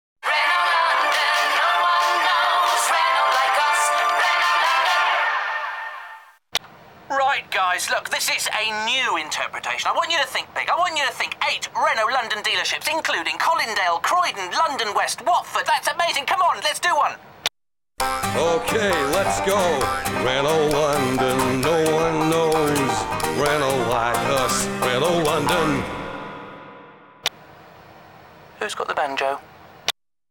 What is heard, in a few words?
Banjo; Renault London